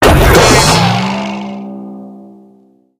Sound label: double donk; video games; source engine; tf